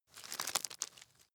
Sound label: nut creak; haus garten; kueche